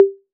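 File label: 808 high conga, Tape 808, Conga; Goldbaby Tape808 & Tape909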